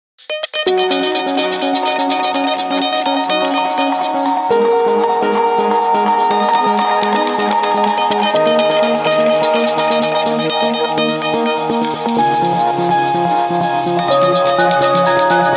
nokia n8 original ringtone

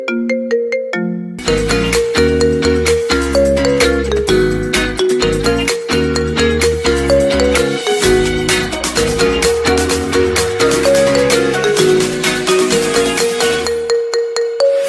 simple ringtone

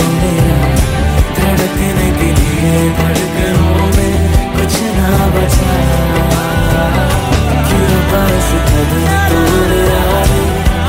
hindi ringtone, bollywood

